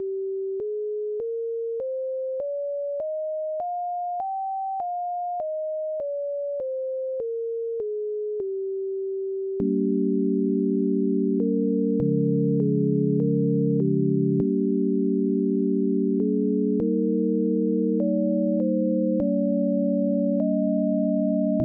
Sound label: 16EDO Mavila7 Studies AntiMixolydian; Mavila7 Studies 5